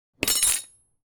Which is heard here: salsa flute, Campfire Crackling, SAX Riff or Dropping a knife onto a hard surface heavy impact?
Dropping a knife onto a hard surface heavy impact